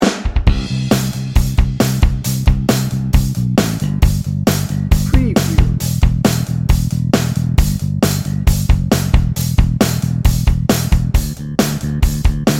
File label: bright and driving